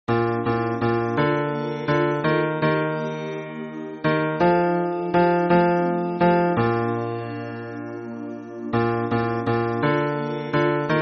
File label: hymn bass